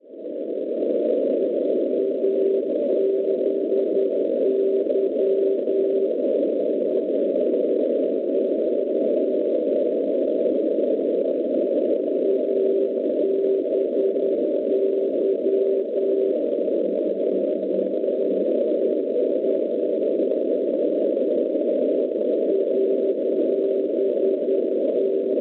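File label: non-directional beacon 321 kHz; ABY; Albert; Francia; Schwarzsee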